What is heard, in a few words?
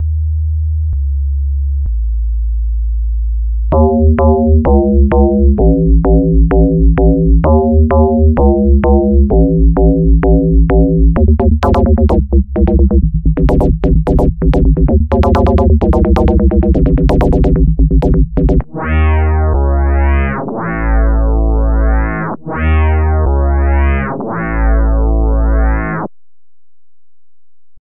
Turbo on Sine Wave